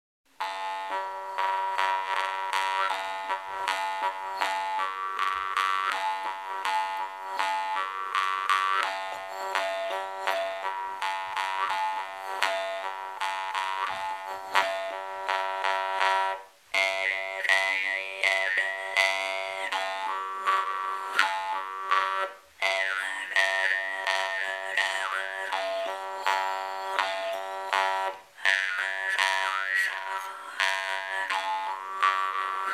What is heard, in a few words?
Tuva Playing The Khomus Jews Harp